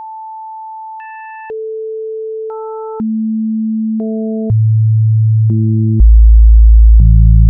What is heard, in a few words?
toot